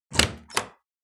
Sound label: Door handle open; Phasmophobia